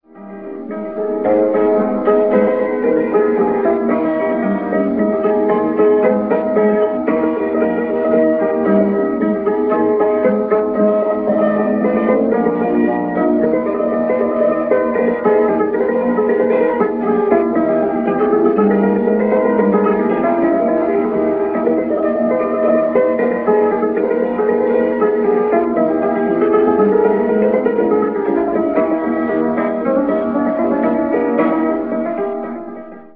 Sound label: klezmer